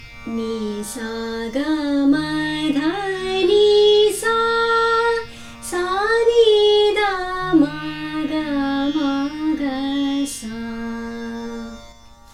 Malkauns Aroh, raag hindustani

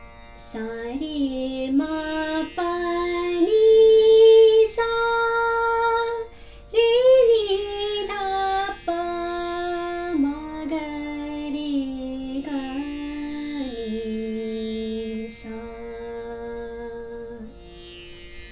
Des Aroh, raag hindustani

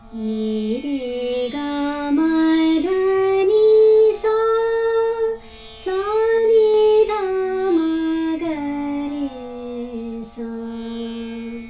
Marwa Aroh, raag hindustani

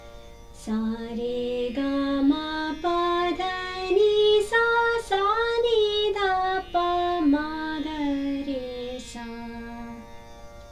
Bhairavi Aroh, raag hindustani

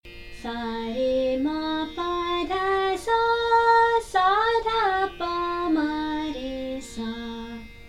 Durga Aroh, raag hindustani

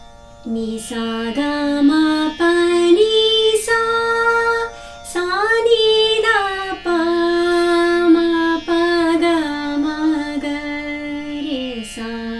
Bihag Aroh, raag hindustani

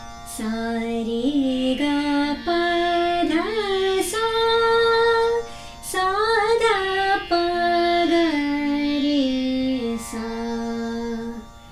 Bhupali Aroh, raag hindustani

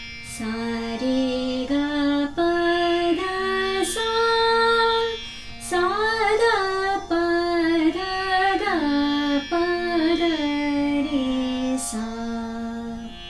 Deshkar Aroh, raag hindustani

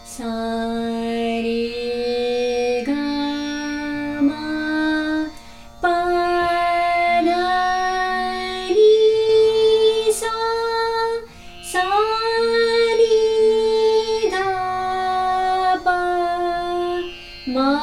Bhairav Aroh, raag hindustani

